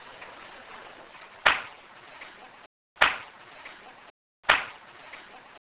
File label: clap in the night; A random bang that resembles a clap; middle of the night; The clip is repeated and cleaned; The Jerome Ghost Report